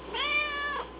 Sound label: cat shorty